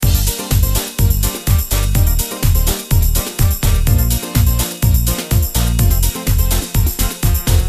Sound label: soccer Menu bg